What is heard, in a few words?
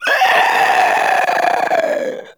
Infested Runner Death; Warframe; Runners